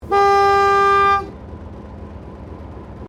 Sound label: Train Diesel Shunter VA1 Class 1947 Tasmanian Idling Whistle